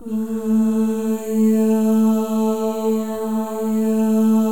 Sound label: MOVING V1, Symphony of Voices; Spectrasonics